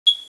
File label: smoke alarm battery low